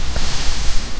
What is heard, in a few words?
the whispery rustle of a, cloth